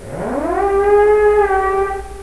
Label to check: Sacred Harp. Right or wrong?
Wrong